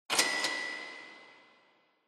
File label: Cash Register Drawer (Low)